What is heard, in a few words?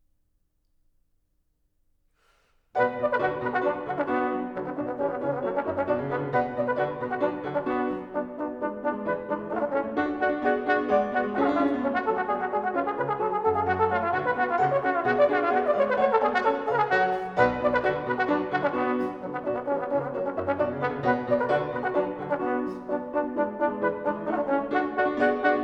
Orchestral Suite No. 2 in B minor, Badinerie, arr for trombone & piano; BWV 1067